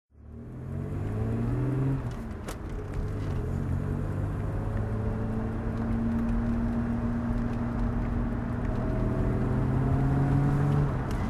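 jaguar xj6 42coupe manual driving, Engine sound driving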